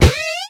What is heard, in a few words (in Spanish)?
Grito de Stunky